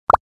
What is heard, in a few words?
Cartoon accent popping